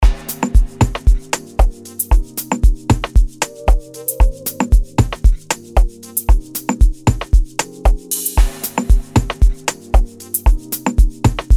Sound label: Mellow Amapiano Drum Loops